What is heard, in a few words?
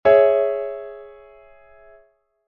C♯m, chord inversions